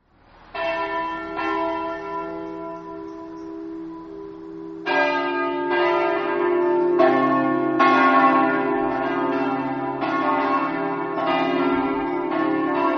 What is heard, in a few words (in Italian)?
cassacco, campane